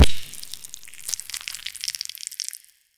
a loud exaggerated bite i

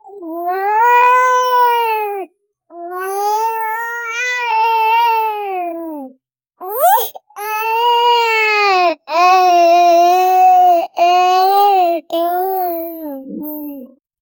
oh ah ah, vocalizations

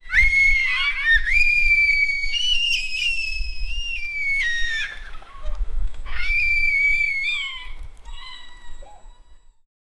joyful laughter and delig, squeals

